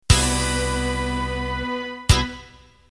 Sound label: Broadway End